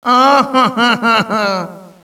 cry, Echo inside caves, underground; DS; ML BOWSERS INSIDE; Luigi

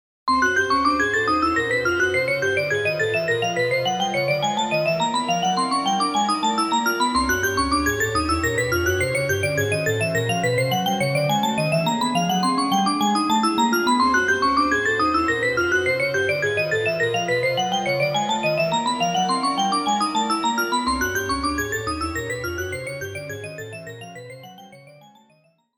Looping Steps; N64; SUPERMARIO64

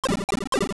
pipe; GBA; MLSS

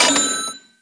Grab Coin; PC; MARIOTEACHESTYPING2

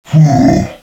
phew; DS; ML BOWSERS INSIDE

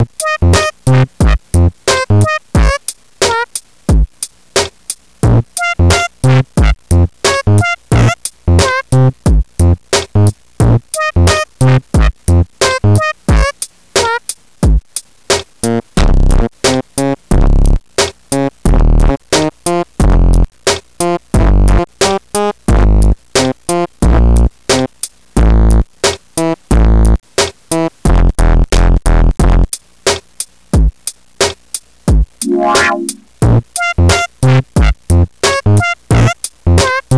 selection music; GAMECUBE; LUIGISMANSION

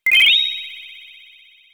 item mushroom; SNES; SMRPG

